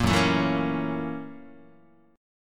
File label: F sharp 7th Sharp 9th F sharp down Guitar; 1,2,0,2,2,0; 4A,4E,5A,5D,5Gsharp,6Csharp; strummed; JGuitar